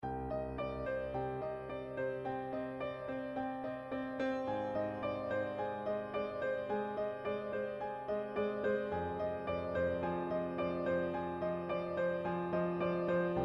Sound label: Piano